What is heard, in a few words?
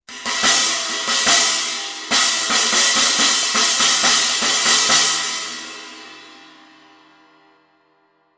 B8 Pro china splash